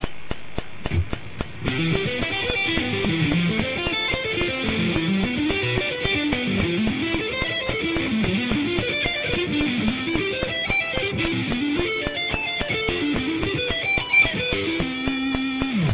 7th arps

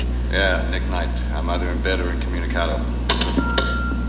answering machine

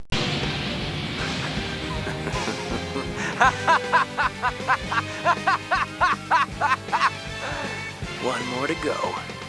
laugh; Heero laughing; Gundam Wing

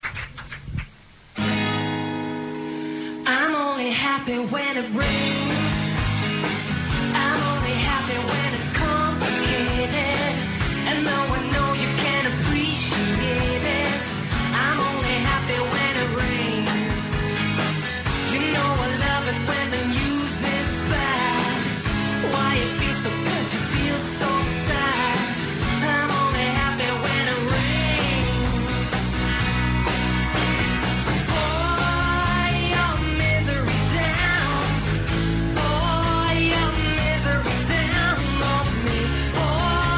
rain acoust

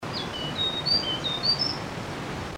Gray cheeked Fulvetta Song; Alcippe morrisonia; Bird Call Recordings

